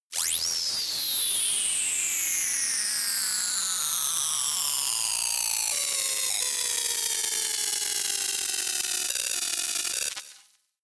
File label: noise crackle drop